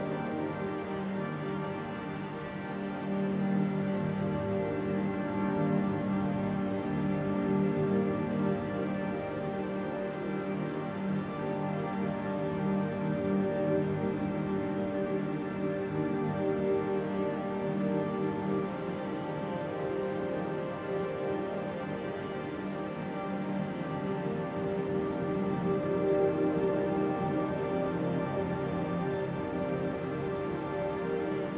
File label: Amb Musical